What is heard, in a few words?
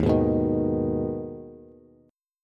Eb Suspended 4th Sharp 5th Eb Bass; Ebsus4#5 strummed; JGuitar